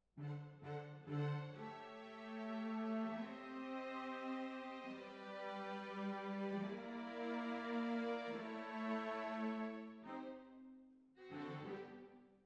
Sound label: Cbasses